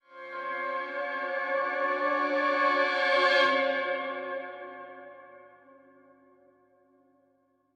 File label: riser Synth; mcsm music; General Stings